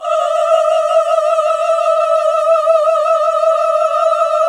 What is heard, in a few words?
GOSP. AAAHH